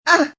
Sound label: Grunt Sad, Grunts, groans, impact, female, short; Groaning Sound Effects; VoiceBox; Twistedtracks